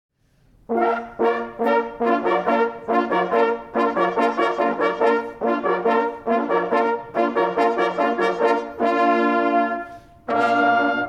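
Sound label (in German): Fuchs tot, Jagdhornbläser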